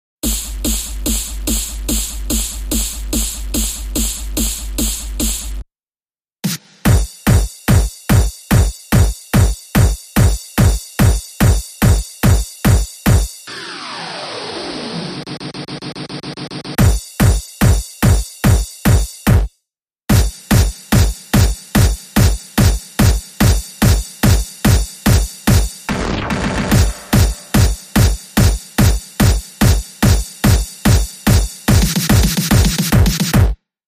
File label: Beat